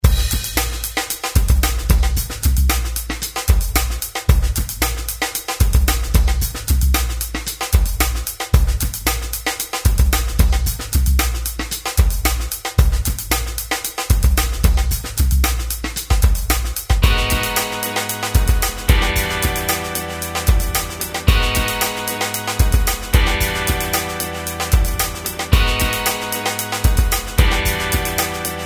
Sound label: BACKGROUND DRUMS; Rosegarden; Z-TV